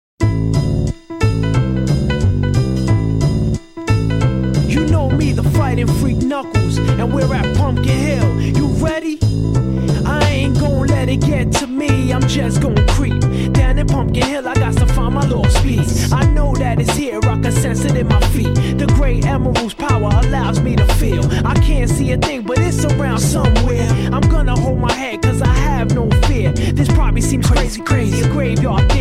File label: Instru